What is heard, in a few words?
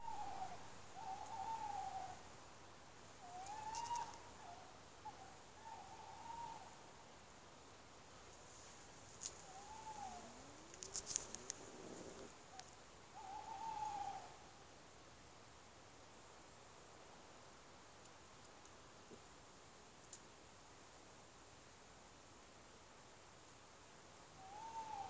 tawny owl